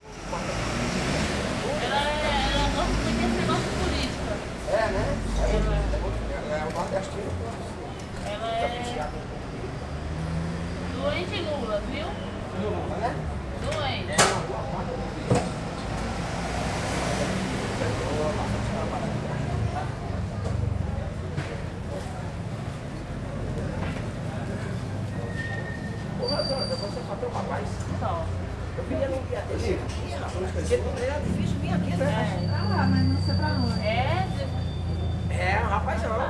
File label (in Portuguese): Feira do Paranoa nas bancas proximas a rua com muito ruido de transito